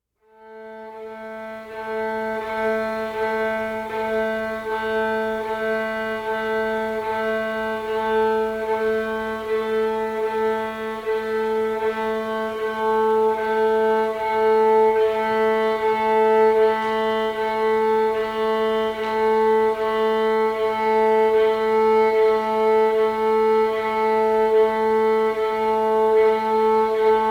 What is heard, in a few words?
violin piece; tim parkinson